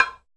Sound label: RESO STIK P, TECHNO; Drums In Your FACE!